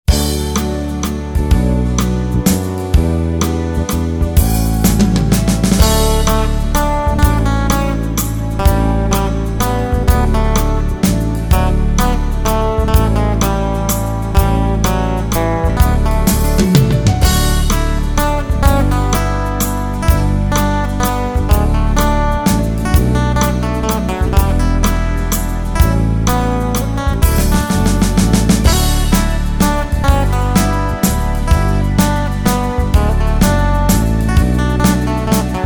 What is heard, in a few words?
Latin Music Instrumentally; Santa Lucia